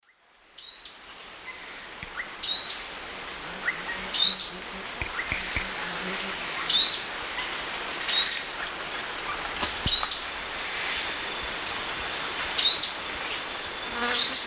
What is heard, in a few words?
Myrmeciza atrothorax, call; S. America; Eastern Lowlands